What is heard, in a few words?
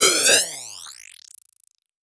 Transition Blast Short Hit; Production Element Title Transition